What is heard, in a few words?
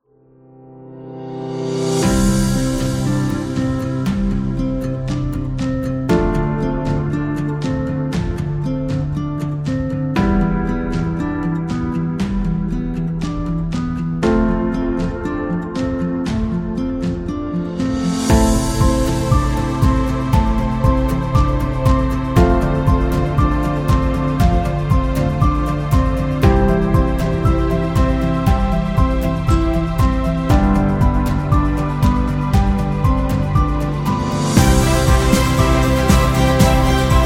Cinematic Guitar; wedding music